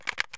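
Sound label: ak magazine in fail; Escape from Tarkov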